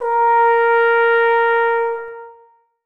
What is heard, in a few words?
tenor trombone a#4; Sonatina Symphonic Orchestra